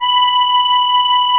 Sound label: STEIRI B 0, STEIRISCHE